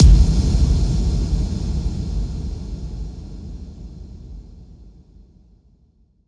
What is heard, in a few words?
electric explosion; Crash Bang Boom